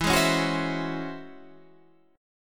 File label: C sharp Minor Major 11th E, chord